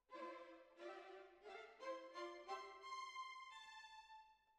Oboe

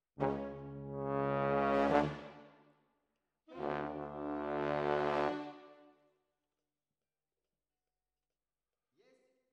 Tromb ASS

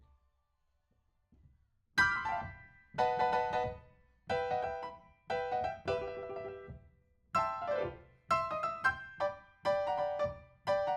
PIANO, RNR for piano

